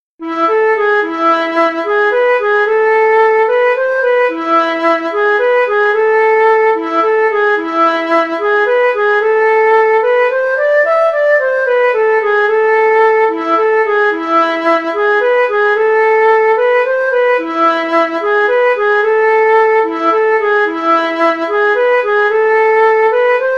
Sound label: Mazurka, nyckelharpa